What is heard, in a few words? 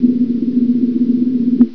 DRUMROLL